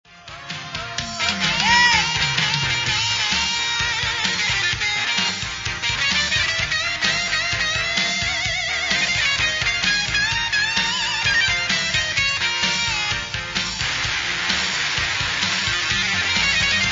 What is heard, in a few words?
old time guitar